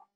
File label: click; tourismustal